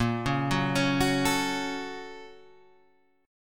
A sharp Minor Major 7th Sharp 5th A sharp Guitar Standard; A#mM7#5 arpeggiated; JGuitar